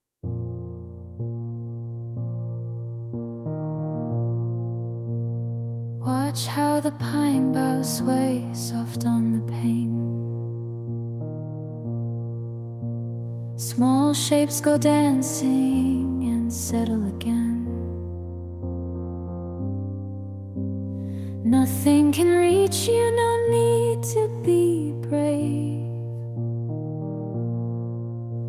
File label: lullaby, name songs; muriel